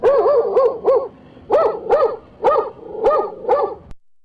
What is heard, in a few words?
Bell, Deurbellen